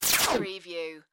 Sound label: Laser Shot Hit